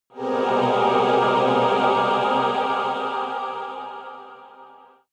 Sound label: evil choir chord medium